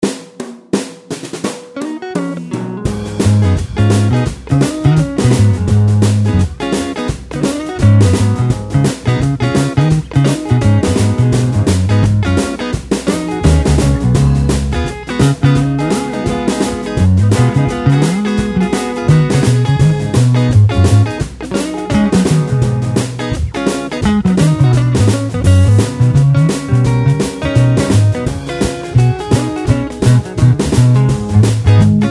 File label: Blues In Ab 85 Solo Bass; Blues Solos